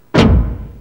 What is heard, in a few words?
PORTA